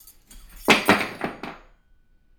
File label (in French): ustensile tombe